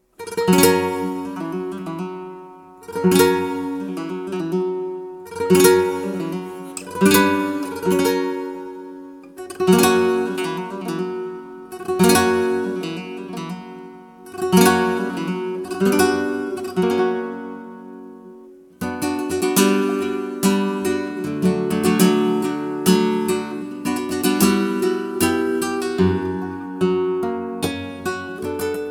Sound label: Guitarist Solea